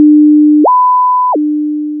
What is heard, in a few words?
basic Sin Automate